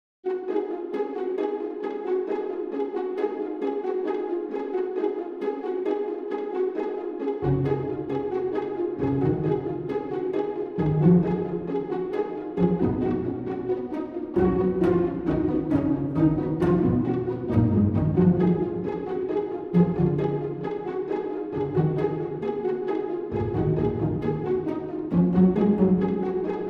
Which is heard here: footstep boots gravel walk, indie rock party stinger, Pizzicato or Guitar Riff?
Pizzicato